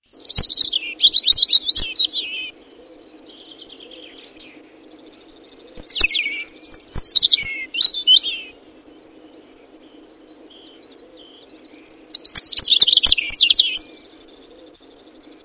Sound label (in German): Rotkehlchen